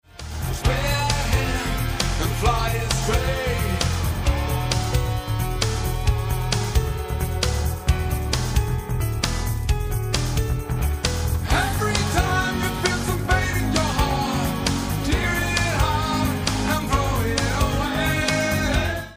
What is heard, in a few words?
bassline